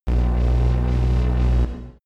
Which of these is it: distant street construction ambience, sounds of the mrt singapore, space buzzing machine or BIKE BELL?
space buzzing machine